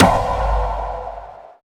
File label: Drums; Distored Reality